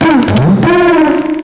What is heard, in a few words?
cry